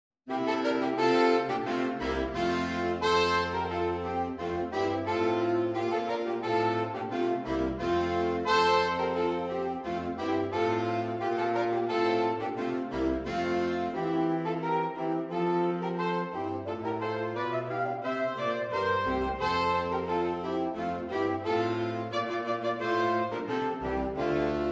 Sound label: Sax; saxophone quartet